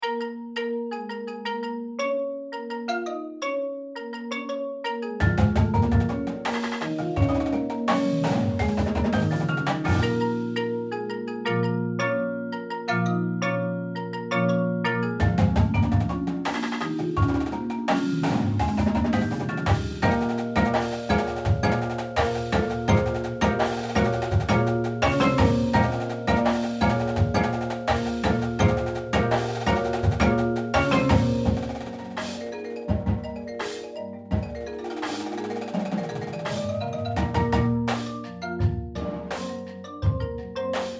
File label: PERC